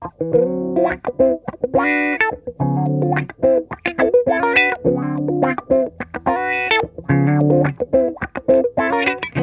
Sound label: Funk Guitar; Zero G - Funk Guitar